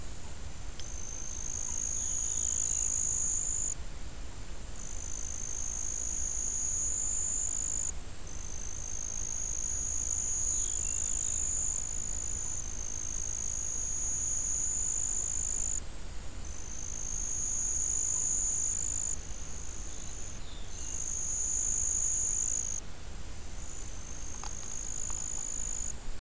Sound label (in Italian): notte cicale; Cicale notturne; Soundscapes